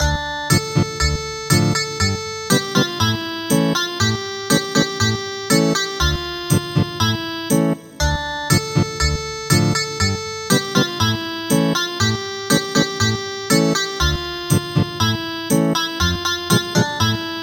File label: Lullaby Ethnic Guitar; traditional; Norwegian